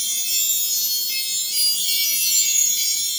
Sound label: crystal; Fate Undiscovered Realms